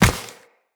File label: Step Ice, Footsteps, Walk; Characters; Tenno